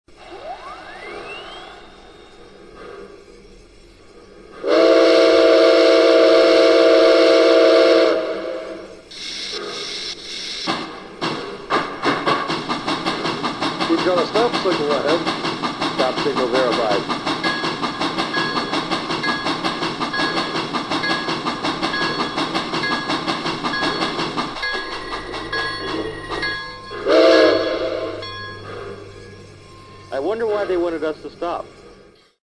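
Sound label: Berkshire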